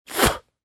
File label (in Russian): vyplevyvaet